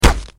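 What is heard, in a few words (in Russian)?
попадание в броню; Контер Страйк ГО попадание в бронежилет; cs go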